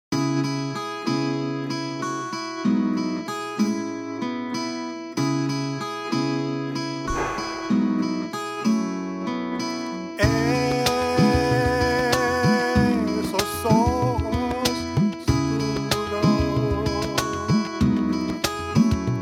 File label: Piropo Vocal sample